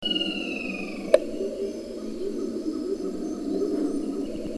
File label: gilded barbet